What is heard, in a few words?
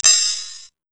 HH OPEN, Fresh Disco House; USB Soundscan vol.20 - Fresh Disco House I